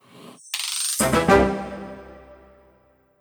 lobby success

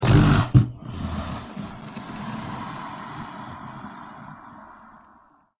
Attack Sound

